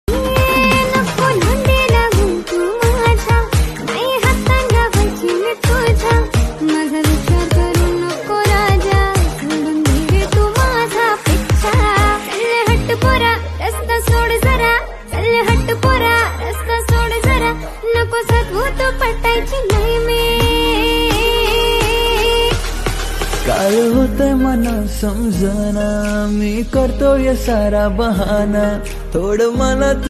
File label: MARATHI SONG RINGTONE